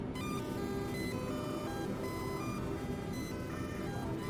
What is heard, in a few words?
8 Bit; Watch Dogs 2